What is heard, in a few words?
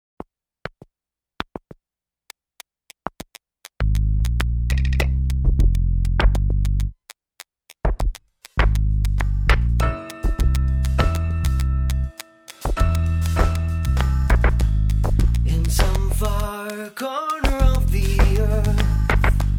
Sad Bird